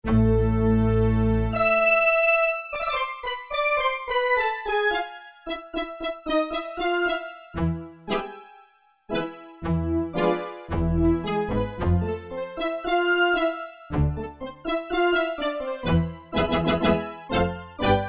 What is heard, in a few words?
STRONG ORCH, Casio VZ-10M; Internal factory combi